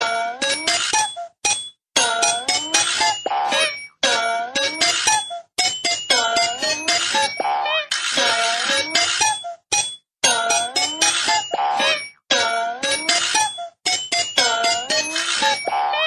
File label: funny tune